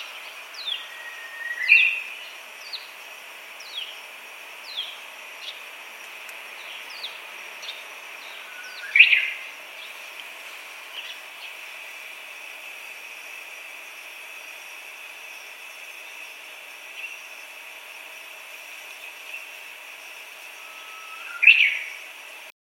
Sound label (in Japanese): uguisu